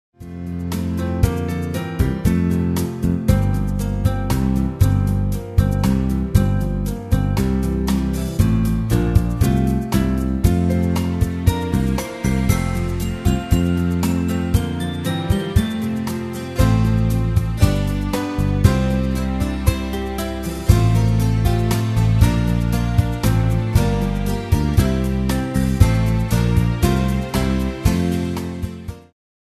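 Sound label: karaoke instrumental twelve days of christmas; christmas favorites; disney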